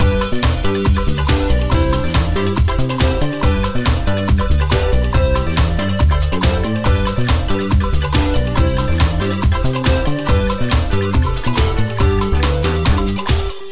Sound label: freespin melody; Amatic; Magic Idol